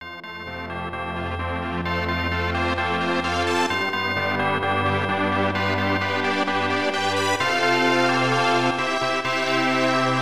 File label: Wedding Bells, soundtrack; lufia 2; snes